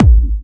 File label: Trance Kicks, Bassdrums